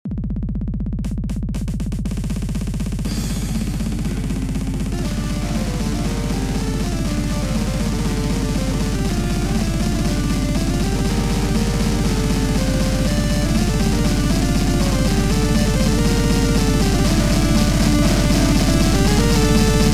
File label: RINGTONE